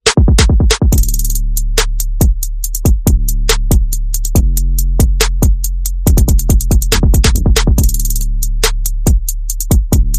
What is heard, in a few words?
Bass and Perc